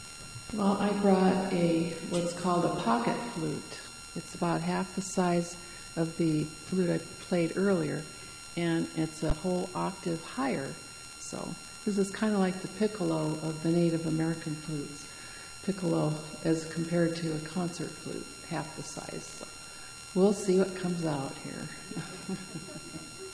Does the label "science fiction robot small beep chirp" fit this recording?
No